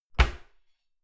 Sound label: cupboard close dishes ringing